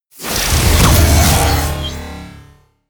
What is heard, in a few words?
sci-fi click generic confirm heavy, swooshes swishes